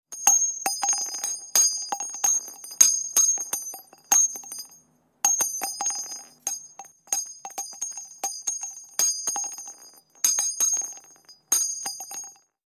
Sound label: Shell Casing Impacts On Asphalt, .50 Cal. Constant